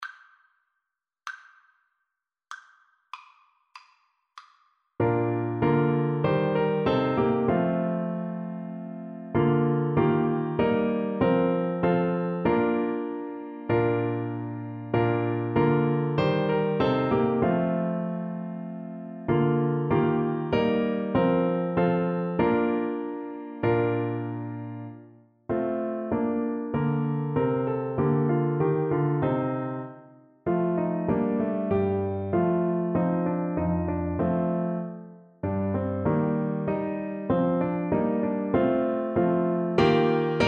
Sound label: Tuba